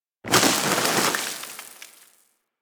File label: Spark Shower; ambience; props; one offs; Fortuna